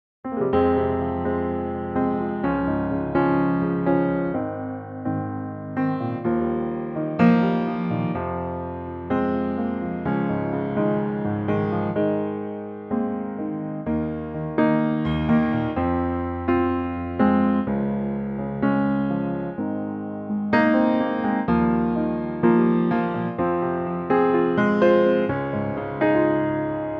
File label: F m piano; key - Fm; range - Eb to Ab; Piano only arrangement